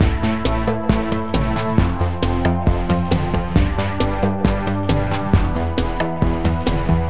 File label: rent loop